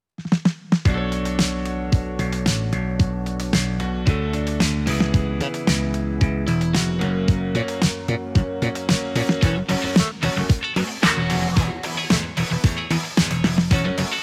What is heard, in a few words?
Disco Party; Tormenture Original Game Soundtrack